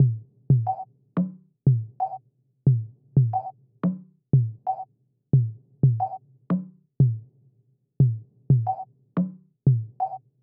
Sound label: 90 BPM F# Min Percs; Loop Stems; Cymatics; Lucid